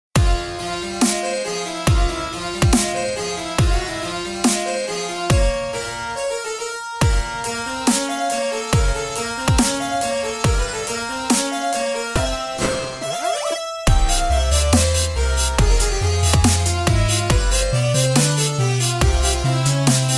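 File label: Harpsichord Dubstep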